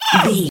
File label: goblin fairy hurt pain